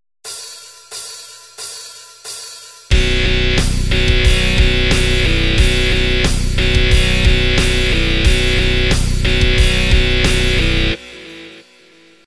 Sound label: Backing Track